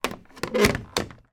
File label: household hairdryer hotel place into wall mounted holder